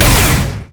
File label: crit attack; lucian skin01